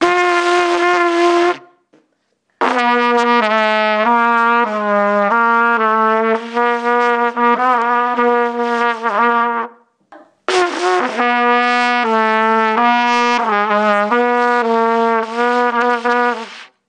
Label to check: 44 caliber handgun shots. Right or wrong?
Wrong